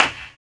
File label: FLAM CLAP; AMG; Global Trance Mission VOL-1